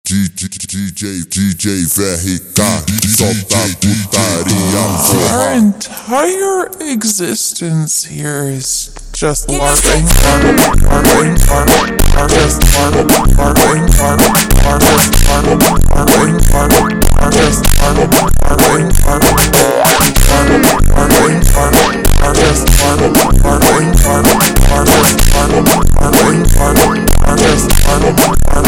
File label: Funk super Slowed, funk